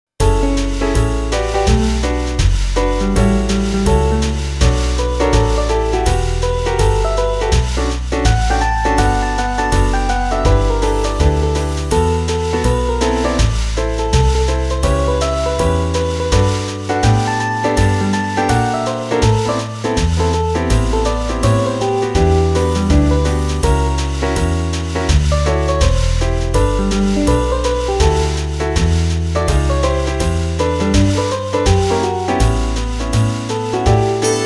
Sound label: Jazz Quartet